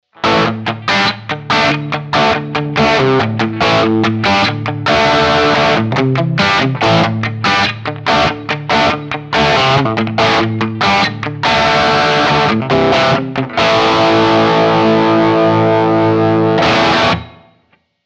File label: gibson les paul lpm bridge crunch